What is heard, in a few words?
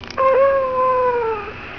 woo